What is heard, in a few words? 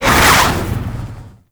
Baby Dragon Split Shot Cast B; Warframe; Duviri; Duviri Void Dragon